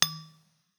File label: Bottle Glass Hard